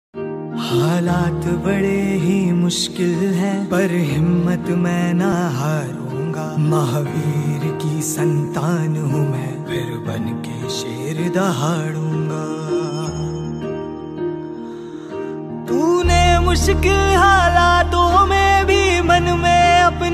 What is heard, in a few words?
jain ringtone